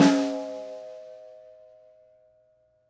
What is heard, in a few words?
Snare High Vol